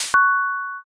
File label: block clear